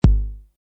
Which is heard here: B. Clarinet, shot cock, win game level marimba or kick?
kick